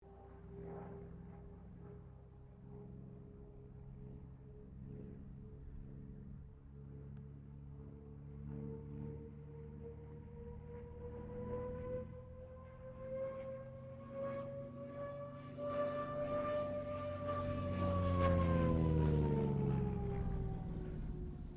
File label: AIRCRAFT CA-13 BOOMERANG PASS; Airplanes; Vehicles